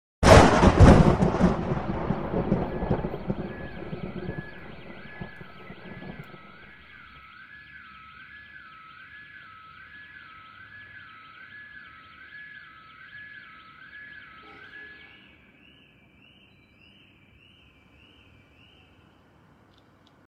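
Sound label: thunder and alarm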